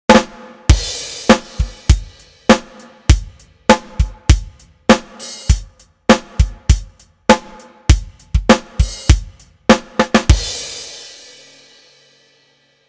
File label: Acoustic